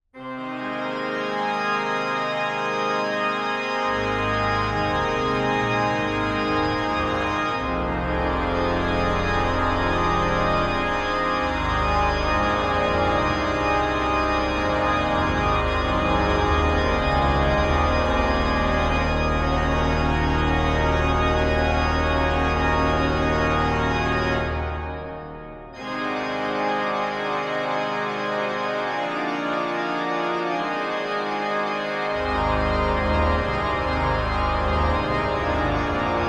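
Carillon after the Bells of Ulm Cathedral for Organ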